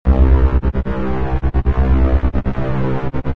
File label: tension arp